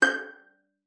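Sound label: violin pizzicato G6; Xsample VOL-5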